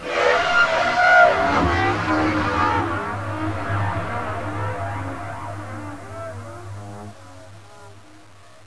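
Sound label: coffin on appear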